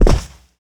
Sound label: land carpet, footsteps; player